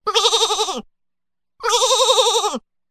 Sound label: sheep ringtone